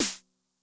SNARE DRUM Med Tune Med Snappy, 909; classic machines; 909mod

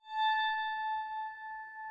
nyckelharpa; SoundBytes - Early Patches

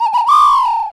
Bird Calls; NorthStar - Global Instruments VOL-2

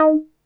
NASTY B, BASSES; Masterbits - Vintage Voltage; VV BASSES

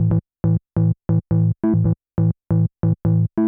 MS20 SEQUE, Techno 138 BPM; NEW BEAT ERA

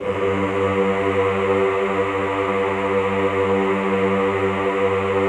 EHH G1, Classical Choir; Best Service; Extended Classical Choir; EHH LO+HI LL

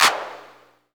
CLAP HOU, Dance Mega Drums; Best Service

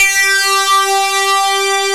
P-5 SYNC G4; E-MU Formula 4000 Series Vol. 3; Analog Odyssey; EmuClassicSynths

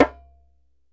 DJEMBE

